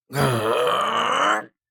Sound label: Adult man grunt of effort noise